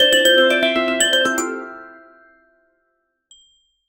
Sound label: irresistible jingle, jingle